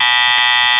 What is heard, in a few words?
times up; Nickelodeon Basketball Stars 3; ThrillGames